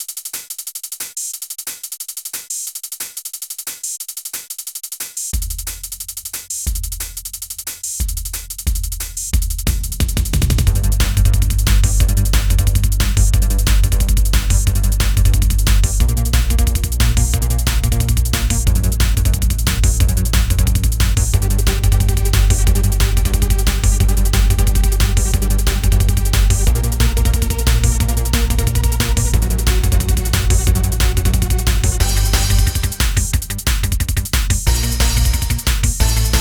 Assault Action Techno War

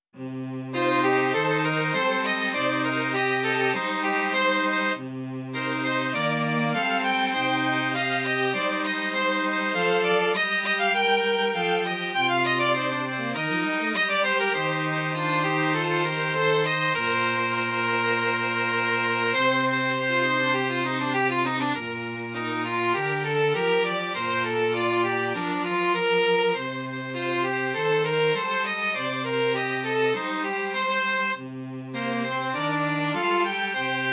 String Quartet

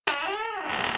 Creak

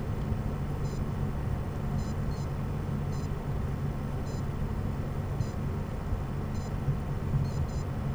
psu beeping

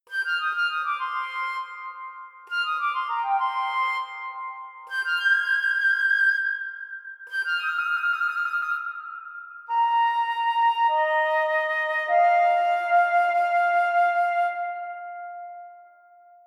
Flute; OpenWorld

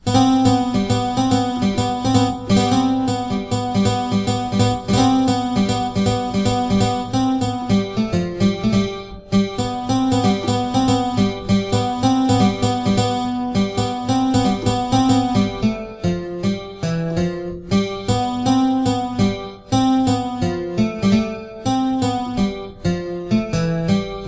When a clean guitar smile

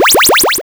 Magic

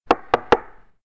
SFX Knocking

